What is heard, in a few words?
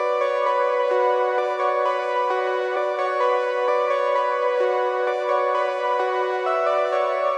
130 BPM B Min OB-X Keys; Synth; Loops; Loop Stems; Cymatics; Fantasy Synth Sample Pack; Lights Out